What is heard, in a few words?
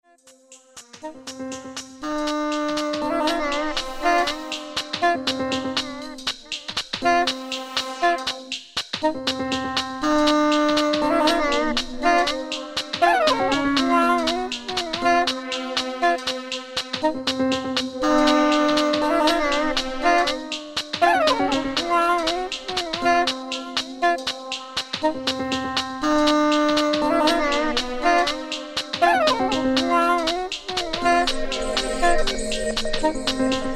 strange sax